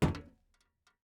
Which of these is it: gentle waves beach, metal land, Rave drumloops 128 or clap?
metal land